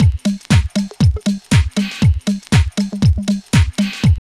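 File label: drum loop; X-Static Goldmine 3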